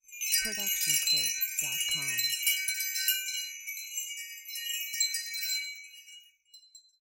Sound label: Wind Chimes B